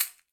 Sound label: Caxixi Open; Shaker